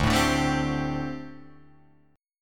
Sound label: D 7th Suspended 4th, down, Guitar, Open F; D7sus4; strummed